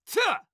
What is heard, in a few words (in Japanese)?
攻撃